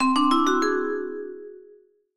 vibraphones music

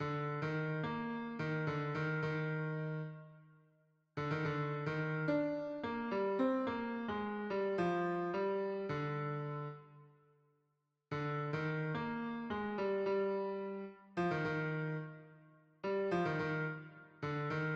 leitmotif bassline

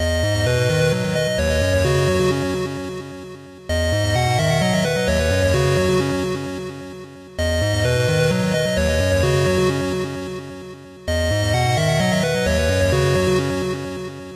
menu music

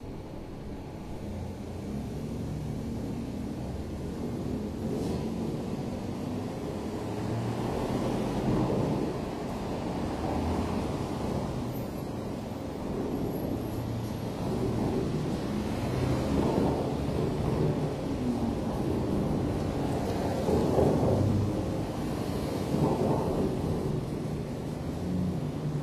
Alley ambience music

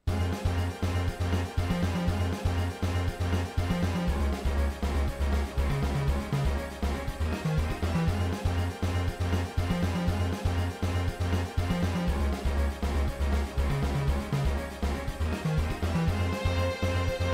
Battle music; Rouxls

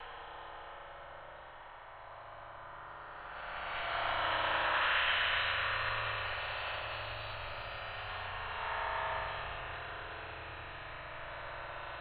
next music

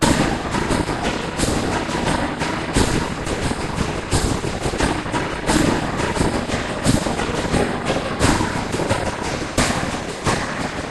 lake chant; Ch5 inversion

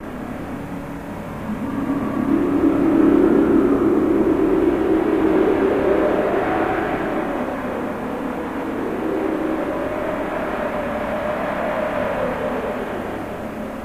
Wind highplace

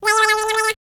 licking

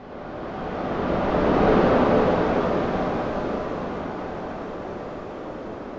Ocean music

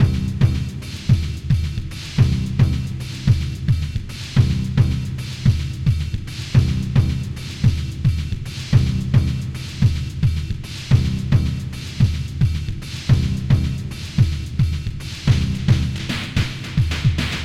heartbeat; Berdly battle

